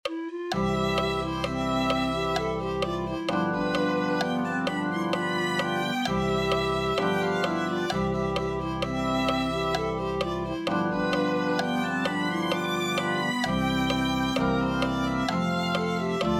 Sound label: violin